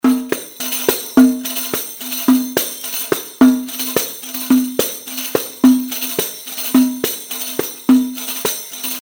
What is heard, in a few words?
Riq